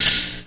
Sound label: hit